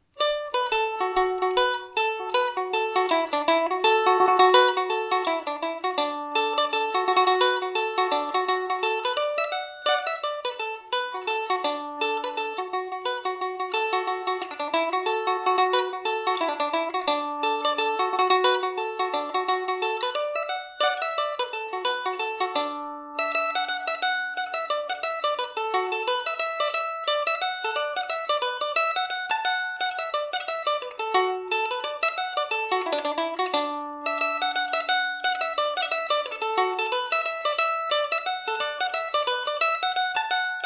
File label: jim donoghues domra